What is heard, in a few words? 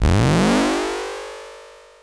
power up